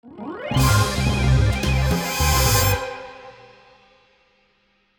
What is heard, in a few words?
Transition